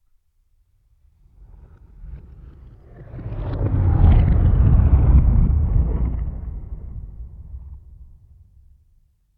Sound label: Fire Swoosh